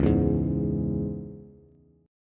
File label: C sharp Minor C sharp Bass, chord; strummed; x,4,2,1; JGuitar